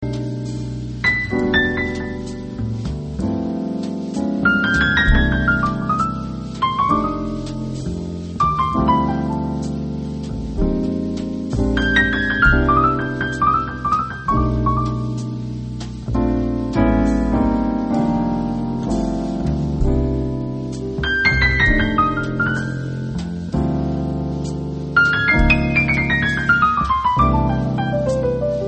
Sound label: tickle of piano, jazz